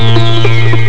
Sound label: ding ding; ST-XX Amiga Soundtracker Sample Packs